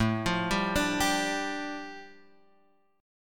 A 7th Suspended 4th Sharp 5th, A, down, Guitar, Open F; A7sus4#5 arpeggiated